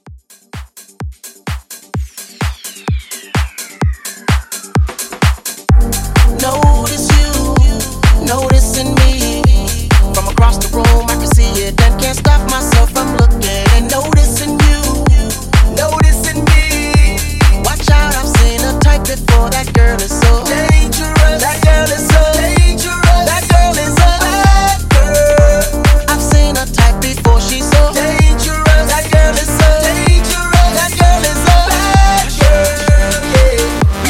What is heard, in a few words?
House Mash Up Rework 128